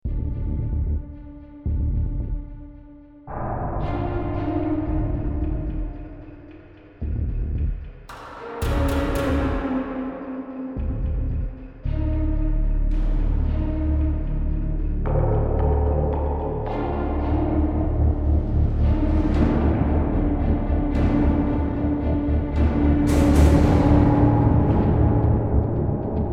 prison outro mood; inFamous; PS3

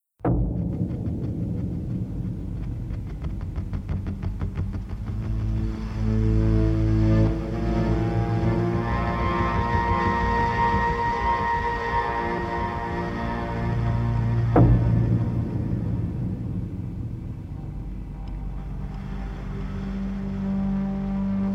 mood; inFamous; PS3

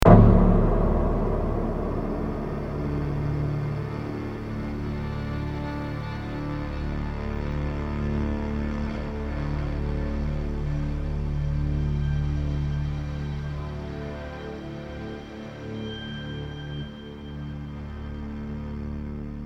mood; inFamous; PS3

